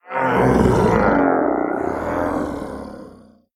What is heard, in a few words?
Stagger; The Town Sam; Devour 2021 gamerip pc macos